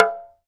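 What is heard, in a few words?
Talking Drums; Roland - LA Composer vol. 1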